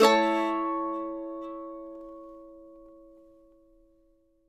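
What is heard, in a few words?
MANDO MAJ A major down, Right; Sampleheads; Whole Lotta Country 1 & 2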